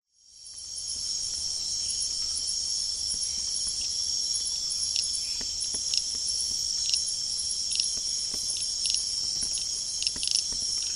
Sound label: Microhyla